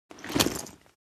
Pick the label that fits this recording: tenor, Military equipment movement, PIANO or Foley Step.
Military equipment movement